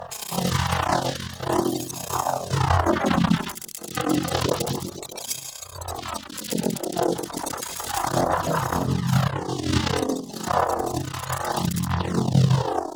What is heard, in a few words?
Energized Loop; Warframe; Warframes; Gyre